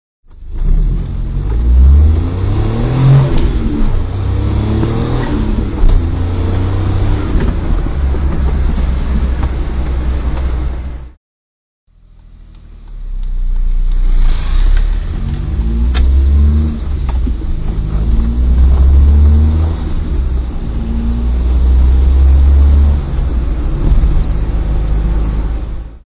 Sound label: engine